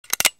colt; svencoop

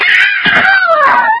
pain, poisoncrab; svencoop; hl2

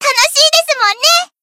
cheer; svencoop; voice commands; hl Koyuki

